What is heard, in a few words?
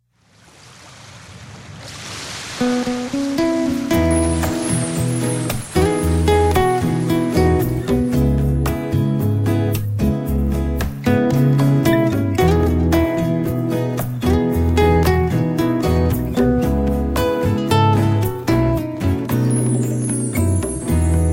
Island Tune